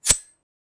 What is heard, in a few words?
a short cartoonish snip s, cutting